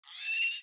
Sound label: ambience room butchery pigs scream